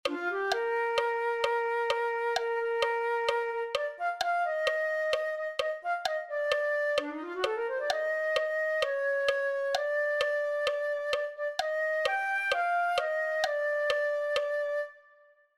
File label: flute